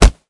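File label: Realistic Kick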